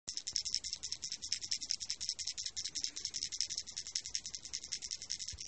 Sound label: CLICK HOPPER